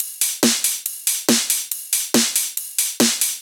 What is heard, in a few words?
Loops 140 BPM Hihat Percussion Loops